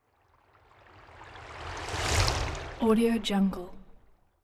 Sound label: water whoosh slow; smartsoundfx